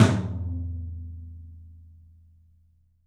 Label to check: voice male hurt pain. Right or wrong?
Wrong